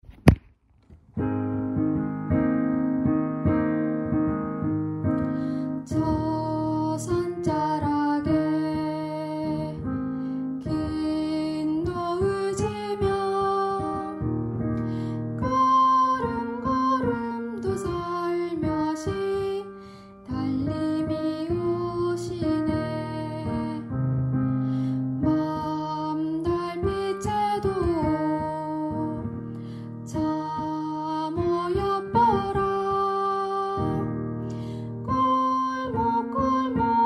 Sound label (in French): tenor